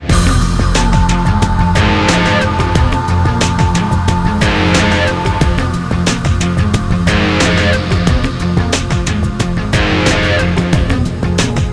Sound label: Battle